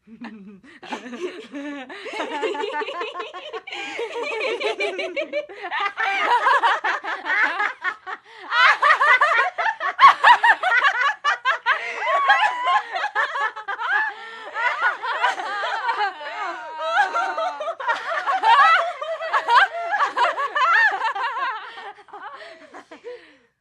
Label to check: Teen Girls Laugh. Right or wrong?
Right